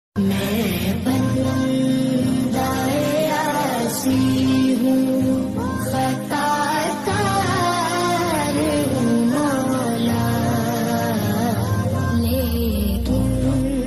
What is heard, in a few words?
islamic ringtone, naat ringtone, arabic ringtone